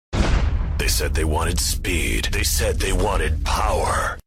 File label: rev it up